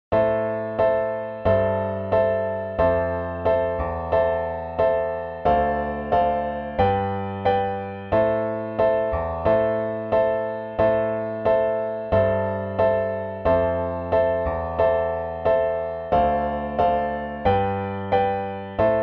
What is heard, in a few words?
rock piano slash chords